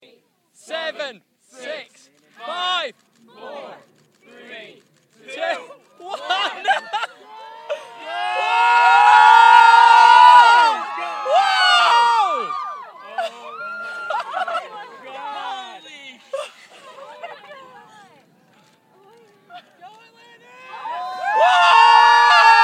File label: the sound of space shuttle atlantis taking off